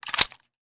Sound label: uzi magin